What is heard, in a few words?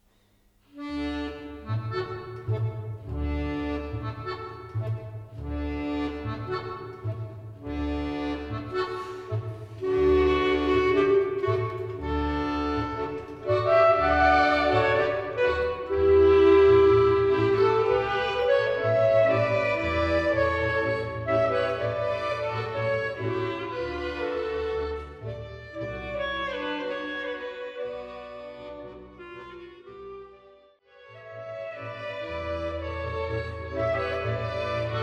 tango mix